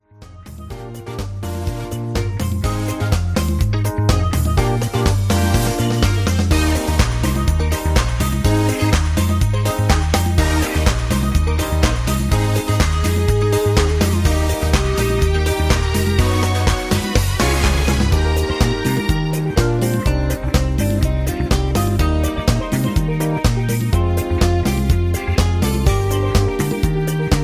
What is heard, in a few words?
Hoedown Instrumental